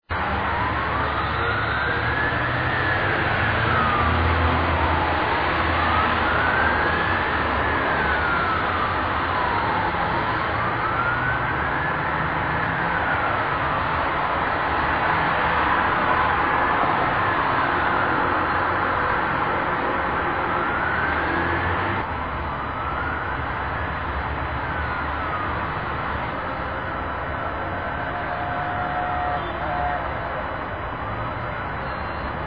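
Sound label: siren bike horn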